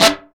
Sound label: SAX LICK, BRASS LICKS; Ueberschall; Jam Box